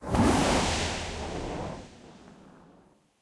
extra multiplier spread; rome

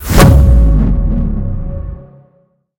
progress change; silverback

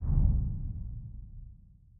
reel stop; rise of maya

